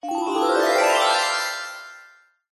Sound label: fantasy magical glissando bells mallets; zapsplat